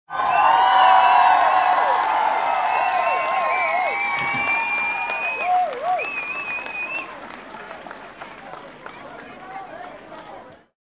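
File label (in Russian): толпа приветствует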